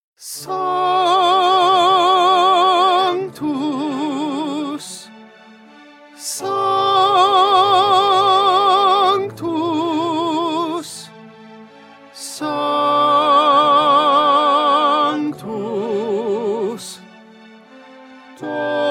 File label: Tenor Sanctus; 2020 Mozart Requiem